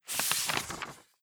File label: page turn